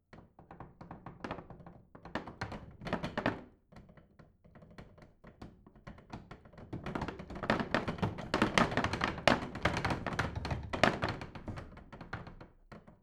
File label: bathroom drawer rattle